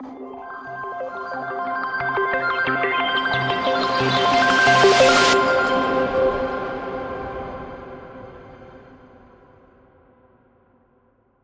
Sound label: Oddities Synth Game Cres